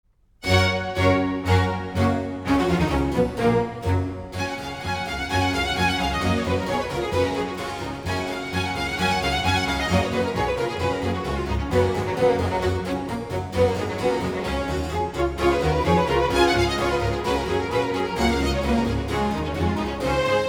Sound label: Sinfonia in G major; Allegro molto